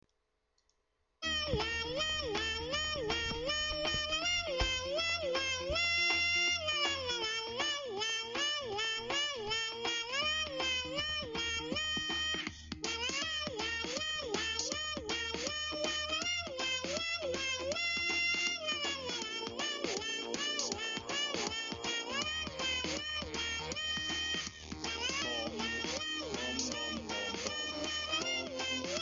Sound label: Singing; Worms